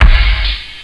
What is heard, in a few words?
kick, percussion instruments; Sound Effects for Multimedia - Volume 1